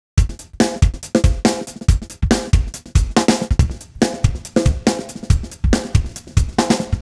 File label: drums, timestretch, 75